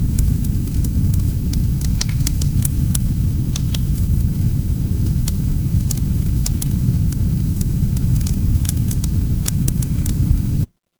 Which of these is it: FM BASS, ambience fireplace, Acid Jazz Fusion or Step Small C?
ambience fireplace